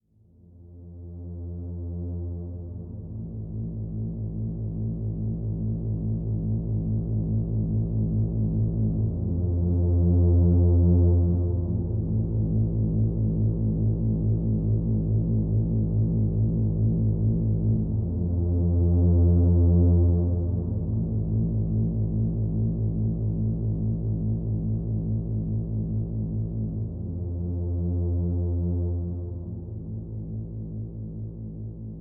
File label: Ship Main Bass; Far Cry